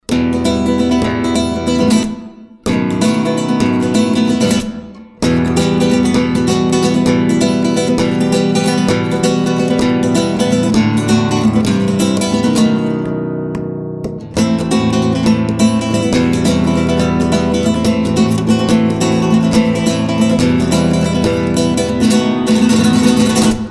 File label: Guitar Chords Hitting Fast